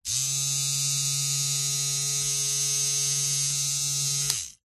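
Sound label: electric trimmer no attachment motor whir buzz; household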